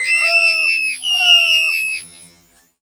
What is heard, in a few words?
Pig eon, Drum&Bass Hits & Bits; Total Drum&Bass; 90 s Sample CDs